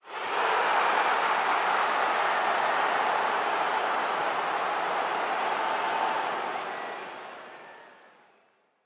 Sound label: cheer goal